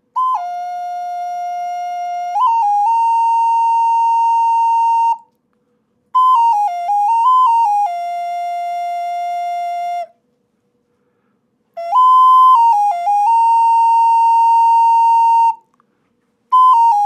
ocarina de barro aguda

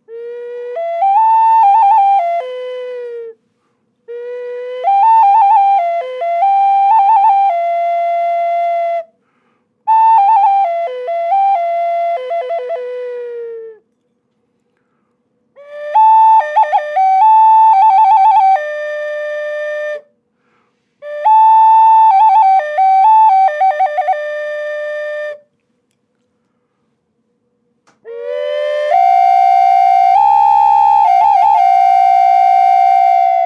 flauta doble mediana

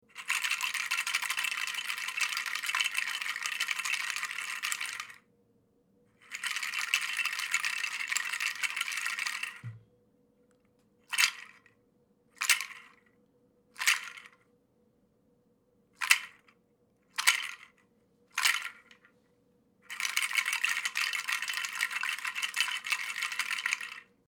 sonaja de semillas

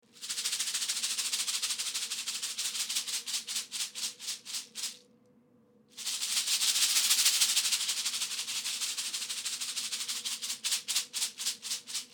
capullos de mariposa con piedras

